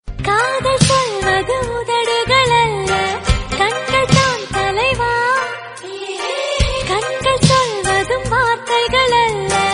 Telegu Songs; Badri Movie

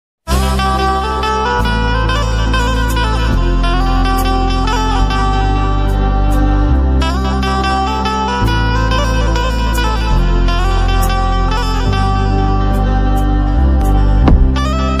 Instrumental

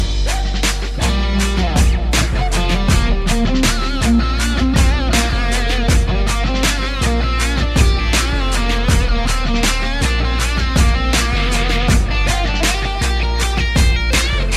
Guitar

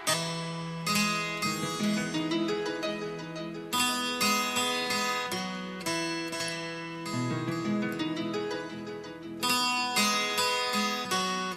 Guitar Ringtone

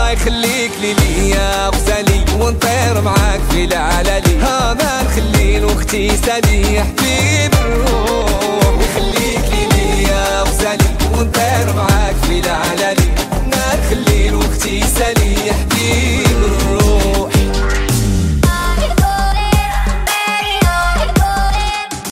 Ringtone

